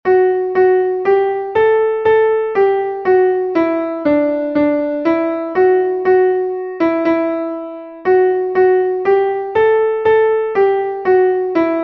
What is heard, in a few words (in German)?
klavier; freude schoener goetterfunken ode an die freude; melodiemeister